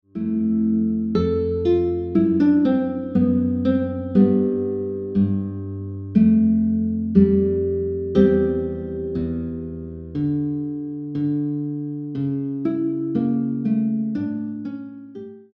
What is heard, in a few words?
Air by Bach, guitar; Johann Sebastian Bach’s Air (from Suite No. 3, BWV 1068), commonly known as Air on the G S